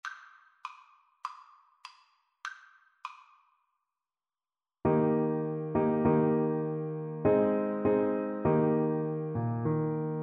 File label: kumbayah, Tuba